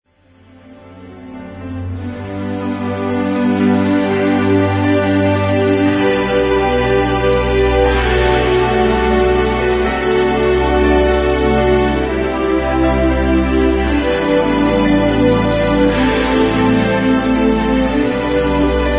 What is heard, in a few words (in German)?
sinfonie